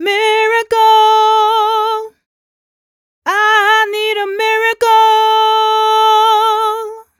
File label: f mel d, fem Vocals